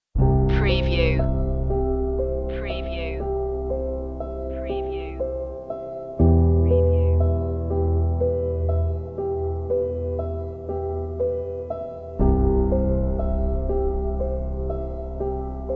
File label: flowing emotions no choir